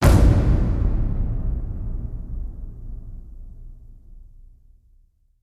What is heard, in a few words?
Hit Big Mondo Slap; Brand X Music; Brand X Music Catalogue - Editor's Essentials